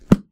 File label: Close Cookbook; Kitchen; Turkey Cooking Simulator